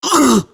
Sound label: Pain; Warframe; Grineer Catbrow Handler; Battle Barks